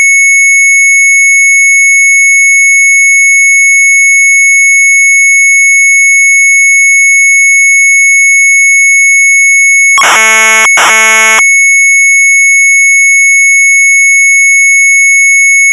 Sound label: MZ800 Tape, sharp; A SHARP MEMORY; retromelsarchive